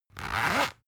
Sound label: zipper close